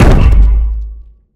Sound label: Footstep Exosuit; Armor; DynamicEuropa; Footsteps